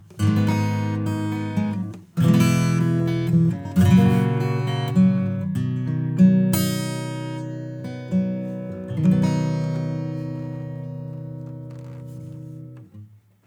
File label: Equalizer Bright Acoustic; Neutron 4